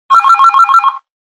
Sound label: Basic bell; Samsung; SCH-i830